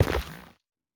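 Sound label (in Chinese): 蘑菇光 踩踏声; 菌光体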